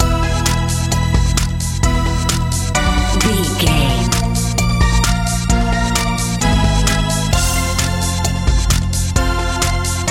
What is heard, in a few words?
pop dance